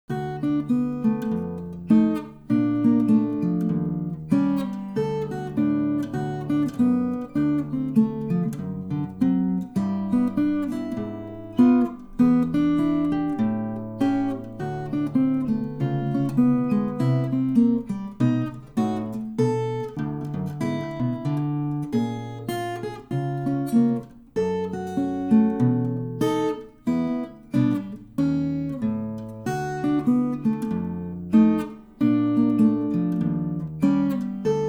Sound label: blues